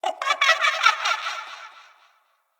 Ambient Giggle; Sproutfolk; Bromius Quest; Neathuns

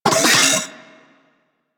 Vocal Alerted; Warframe; Corpus; Mini Moa

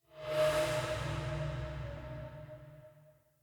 Coin Toss Transition In; Level Events; Midrath

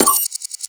Moa Data Pad Press; Characters; Warframe; The New War; Veso

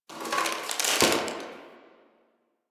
Box Of Trash Rustle

